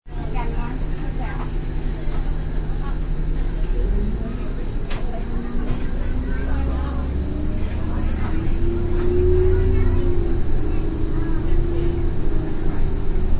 Insd Bus, People and bus sounds from inside moving bus.; Transportation